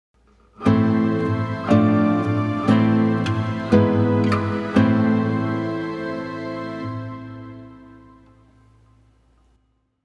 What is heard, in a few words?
Classical Guitar Cadences